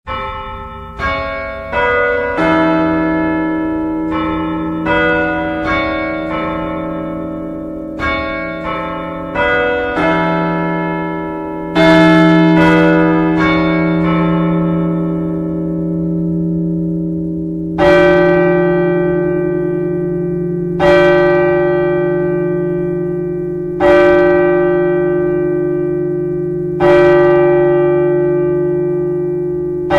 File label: Bell Tower; 8AM